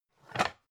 pan stack

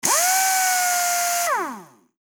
pneumatic impact wrench

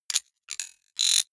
sci-fi electromechanics small stutter metallic

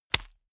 small punch fleshy hard

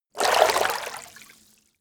water light

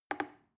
plastic button little click soft fast

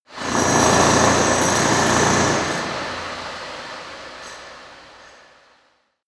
construction site stone drill single

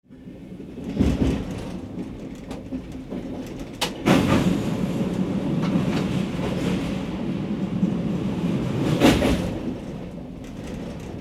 regional train track switches cabin inside

